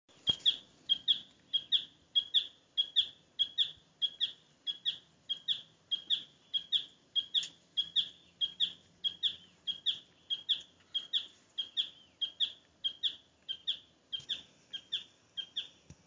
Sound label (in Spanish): Churrin Chico